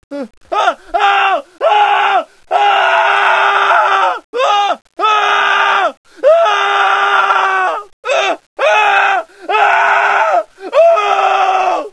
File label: random shouting; props taunts new; phx new taunts pack